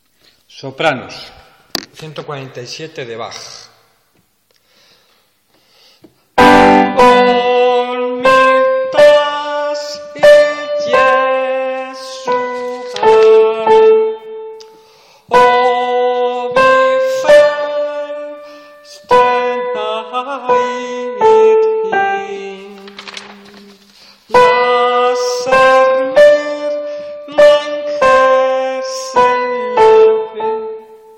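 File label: soprano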